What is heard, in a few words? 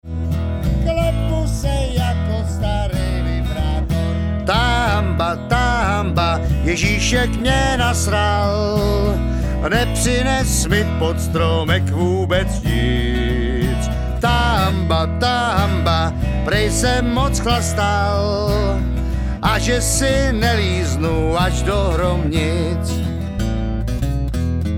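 Ta Hamba vocal lead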